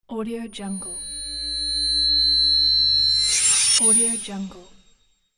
radical shift

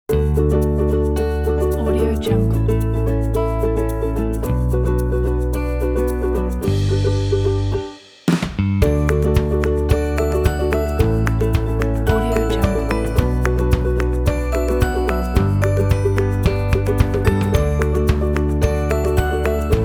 upbeat ukulele

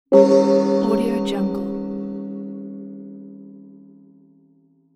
tibetan bell

